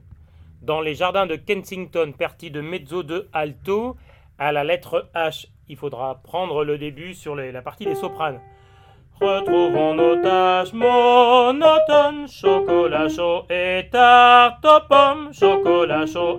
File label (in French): Alto